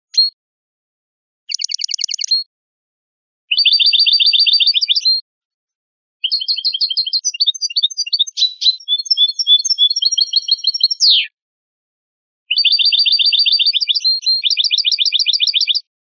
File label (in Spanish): faltas pines